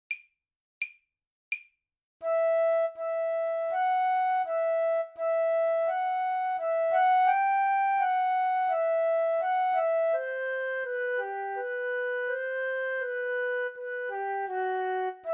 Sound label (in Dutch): Fluit; Sakura